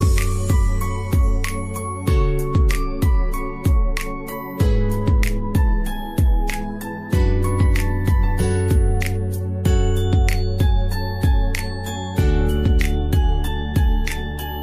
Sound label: Soft Piano Ringtone